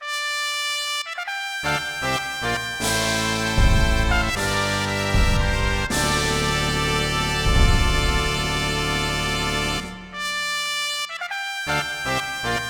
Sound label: Unknown Fanfare; Chocobo Stallion